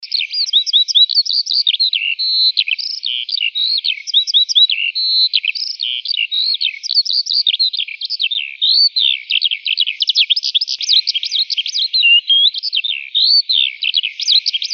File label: Lark Song